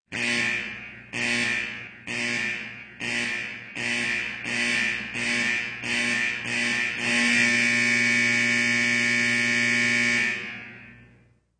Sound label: Beeps; 헐리웃특수효과음; 신호-경적